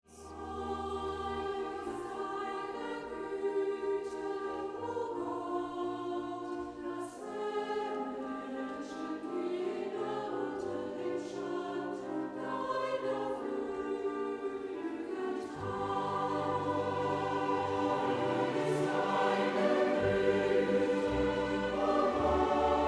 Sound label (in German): Chor; Erntefeier; Wie teuer ist deine Guete o Gott